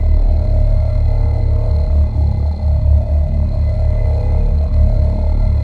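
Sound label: repulsor Pallet move loop; machines mechanical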